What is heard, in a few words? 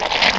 attack; allods2d